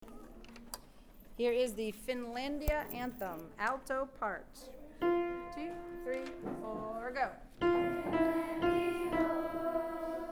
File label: alto; Finalndia